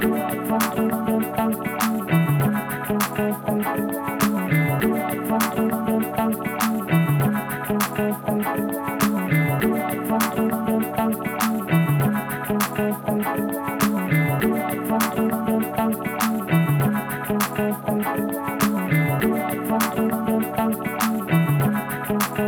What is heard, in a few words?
Loop